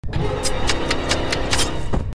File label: knife stab kill sound effect; among us